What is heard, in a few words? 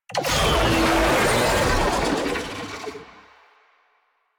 Infested Oni Vocal Idle; Warframe